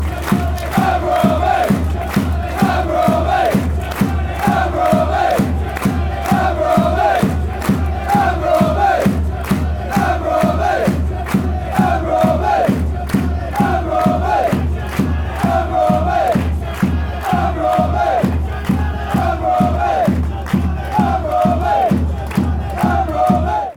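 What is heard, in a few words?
amber army, football chants; cufc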